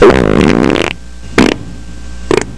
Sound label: fart